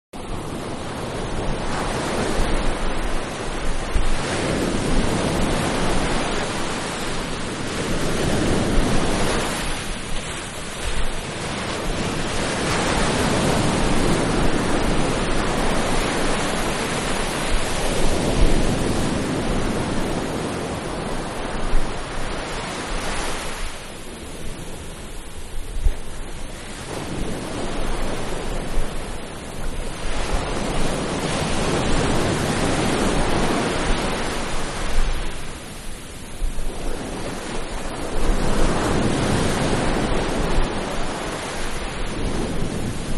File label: Beach-Waves-Ambience; Ocean; Nature; Manhattan Production Music